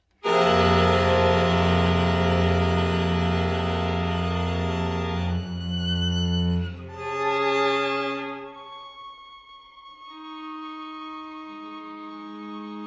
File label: string quartet larghetto